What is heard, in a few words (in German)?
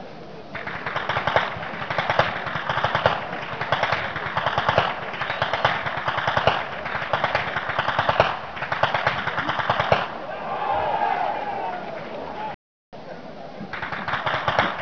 schnalzen, jugend; Ainring